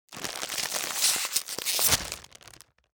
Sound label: BAG CHIPS